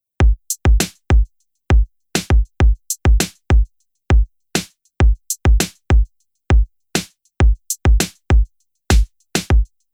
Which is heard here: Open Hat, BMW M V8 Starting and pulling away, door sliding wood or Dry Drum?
Dry Drum